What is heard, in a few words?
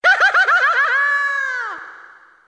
scream; luigi